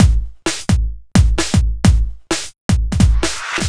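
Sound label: Tinny Break bass drum snare, Drum Loops 130bpm; Zero-G; Soundclash Nu Dance Electro; Acidized Wavs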